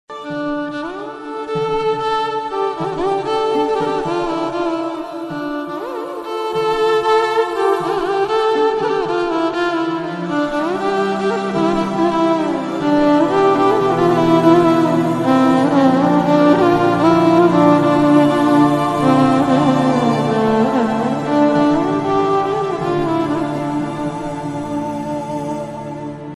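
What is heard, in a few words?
violin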